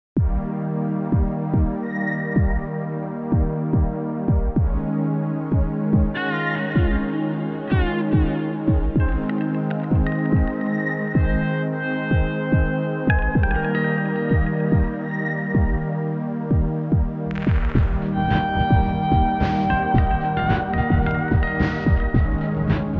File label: Waves